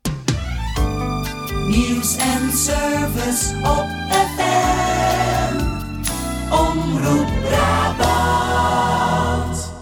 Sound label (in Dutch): omroep jingles